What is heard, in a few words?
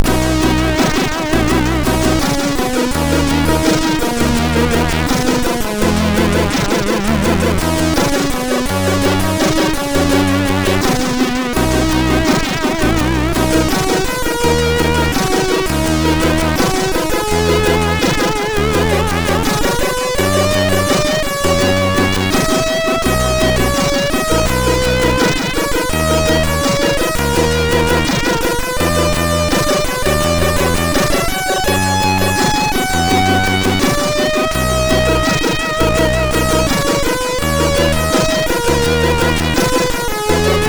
Game Over, MOS6581